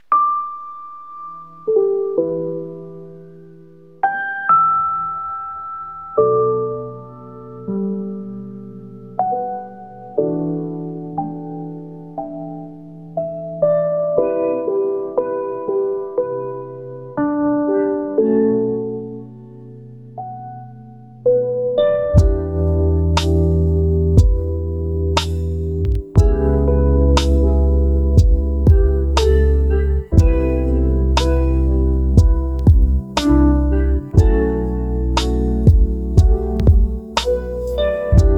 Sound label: rainy lofi